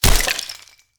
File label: fist hit wood